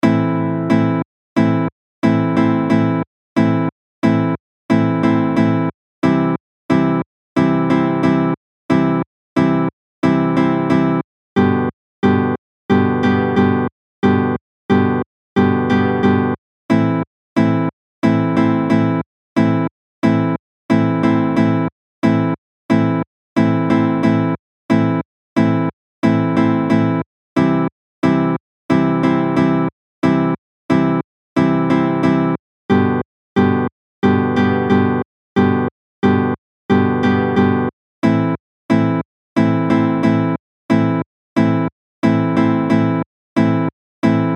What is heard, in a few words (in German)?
nur Gitarre; pfadfinden